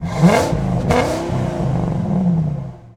Truck Ford250 Rev; Trucks

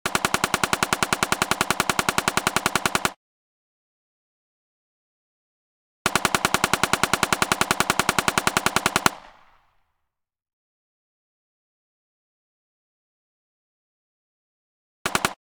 Uzi Simulation, 3 Shot Burst; Hollywood Edge; Heavily Armed

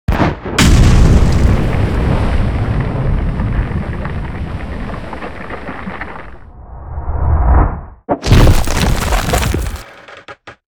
Cannons Kraken

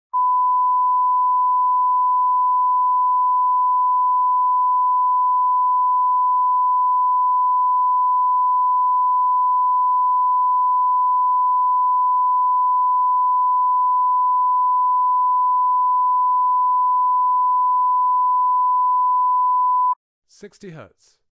1kHz reference tone @ -14dB FS; Alan Parsons & Stephen Court - Sound Check 1993

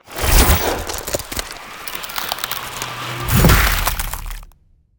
Ice Spell Explosion Impact Cryo Burst; Ice Explosions & Impacts; Arctic Aura

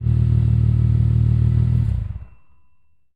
Prowler Off, Automobiles

